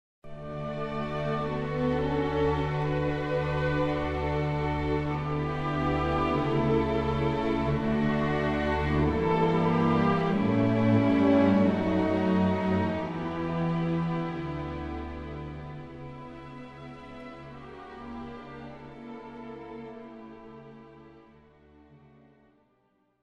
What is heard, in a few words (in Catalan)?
Orquestra Coral; Josep Soler